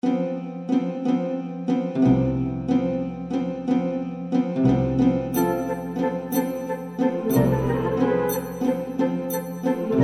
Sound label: theater music Greek Dance; Lysistrata